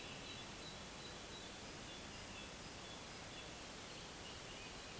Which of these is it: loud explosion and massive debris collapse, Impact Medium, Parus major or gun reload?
Parus major